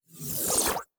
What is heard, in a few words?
Teleport, Sci-Fi Sounds